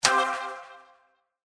Spin Stop Win; Bombo

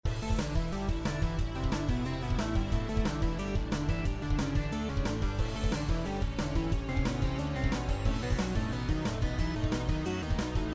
Free Spin BGM; GalaxyQuest

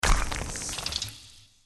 Rocks Spider; slots; Halloween Blast

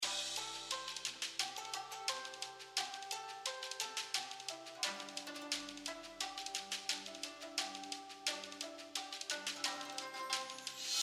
BGM Paytable; Chinese New Year Deluxe

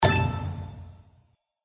Click; AztecFate

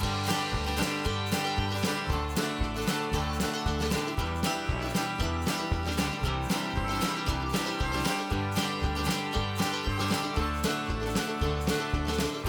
BGM BONUS, SLOTS; Golden Barnyard

